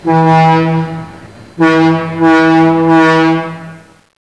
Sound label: click